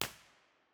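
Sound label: tunnel entrance b 4 way, impulse response, Innocent Railway tunnel; b-format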